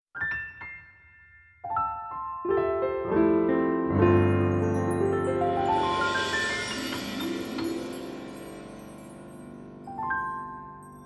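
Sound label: particle logo interpretation in piano rhythm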